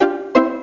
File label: Accept